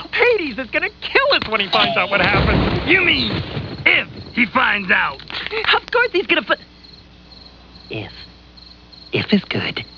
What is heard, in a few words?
pain